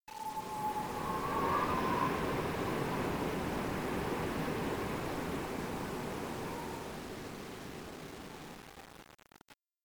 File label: Wind Sound; Learn to Fly 3